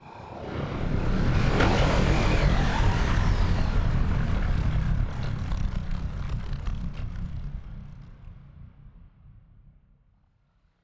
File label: ship transport ship move; Stellaris; machineage